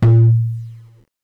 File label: darbuka note